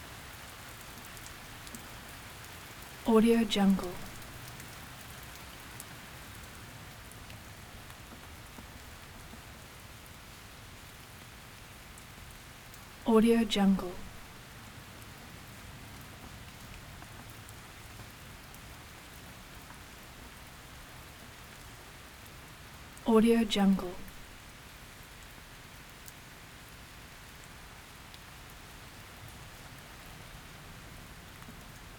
rainstorm heavy summer downpour; prosoundfx

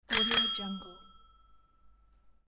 bicycle bell

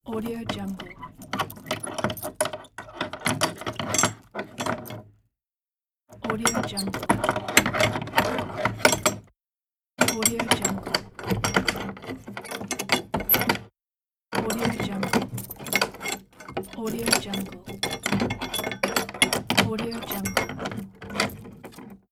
padlock shaken

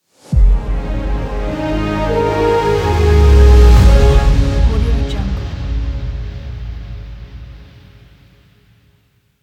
short cinematic stinger logo

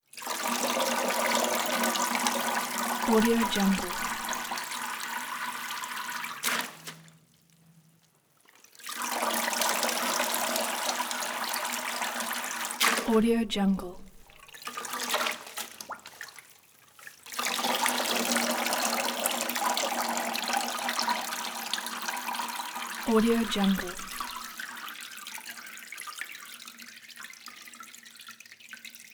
wet mop drip pulling out of water

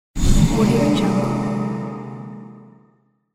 jump scare; infinity sfx productions

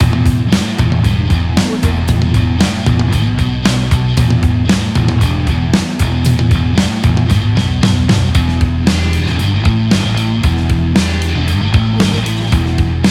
cool drive rock kit

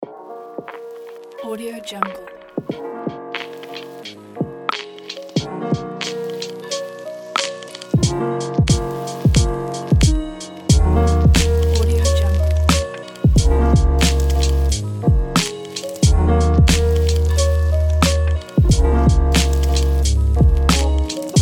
lofi beat; comastudio

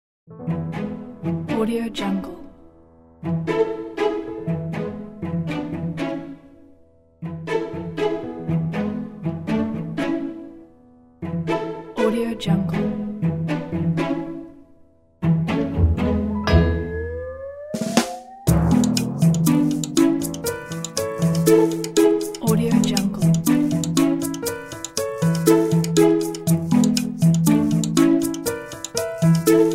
funny music

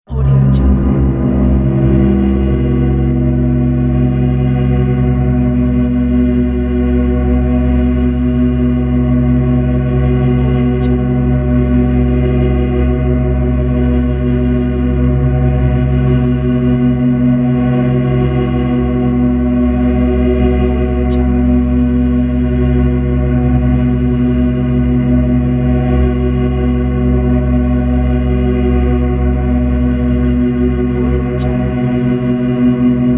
drone

